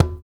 Congas, African Contemporary; Spectrasonics; Supreme Beats

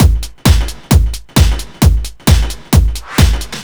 Techno Groove Drums, 132bpm; Zero-G; Soundclash; Combi Loops; Nu Dance Electro

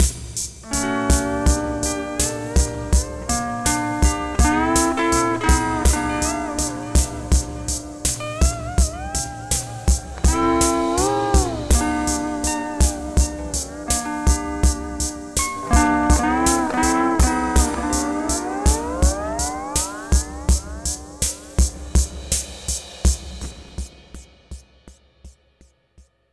Sunrise Full D, Lounge; Zero-G Kocktail Kollection; Construction Kitz (Lounge); Sunrise Mixes 082

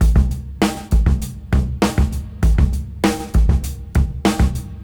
Break 99 BPM; AMG; Keith LeBlanc Phat Butt Jams

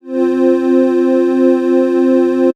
lead tube F; leads

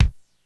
Kick, Korg DSS-1

